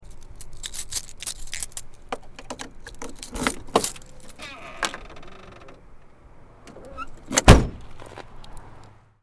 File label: car trunk open close; Mono